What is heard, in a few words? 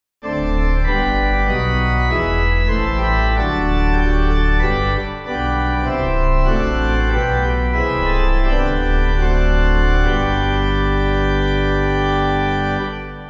Pipe